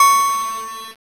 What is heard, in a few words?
ding